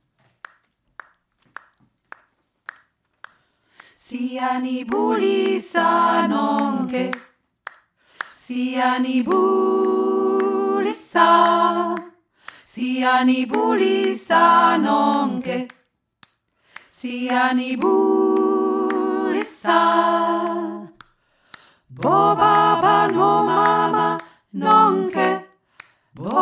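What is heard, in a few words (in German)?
afrikanisches Begrüßungslied; siyani bulisa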